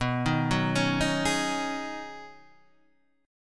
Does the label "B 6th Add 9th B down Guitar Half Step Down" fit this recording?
Yes